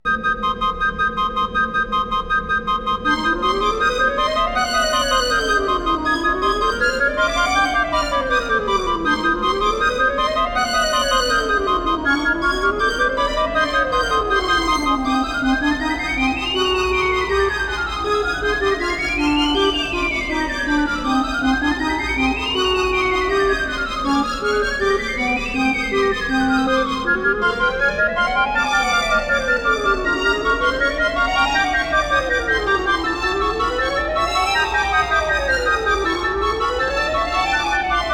Wind Tower; Creepy Castle; Donkey Kong 64

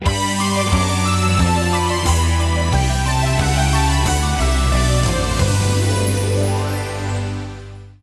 Victory Fanfare; Mother; Super Smash Bros. Brawl

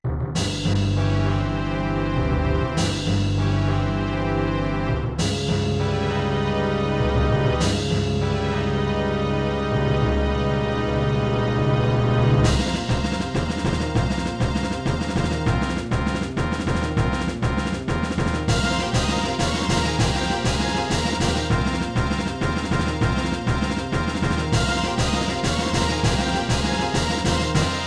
Boss B (without trumpets); Star Fox 64

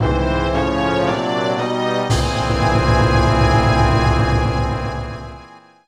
Dungeon Appears; Legend of Zelda, The : Majora's Mask

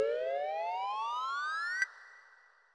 Fade In SFX; Banjo Tooie

